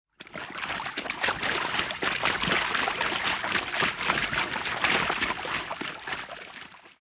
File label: Footsteps Water; The 39 Steps